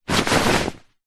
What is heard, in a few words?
foley clothing shirt grab pick up